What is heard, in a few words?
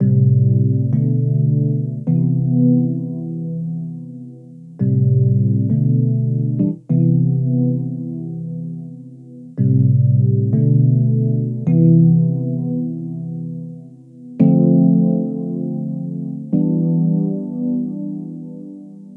Keyboard